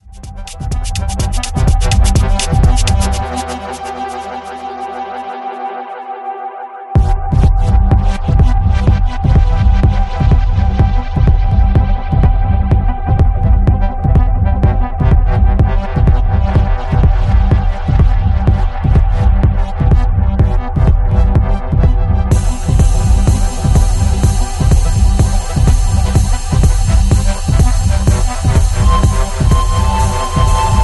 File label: accordion